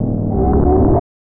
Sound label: Dragon Roar; dragon s lair snes gamerip